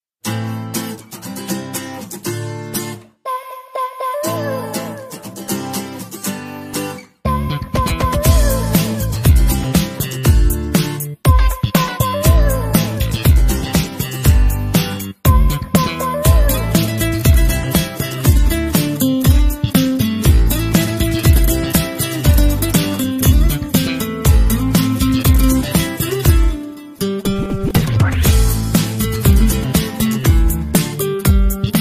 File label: new love bgm ringtone in telugu; adavallu meeku joharlu; sarvanandh